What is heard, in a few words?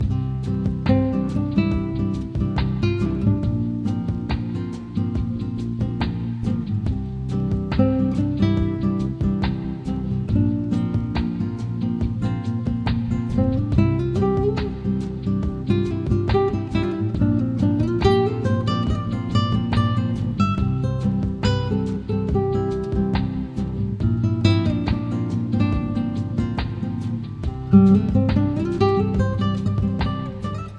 elevator bliss, music